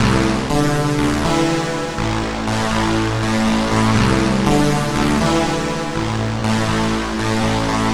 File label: Noisy Gate, Synths